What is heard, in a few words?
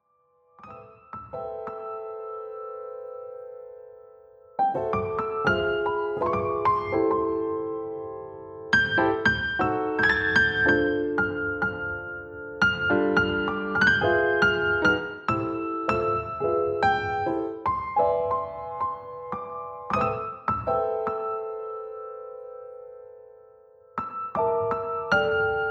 Piano Christmas Medley